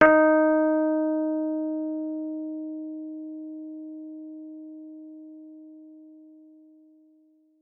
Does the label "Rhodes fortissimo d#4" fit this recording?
Yes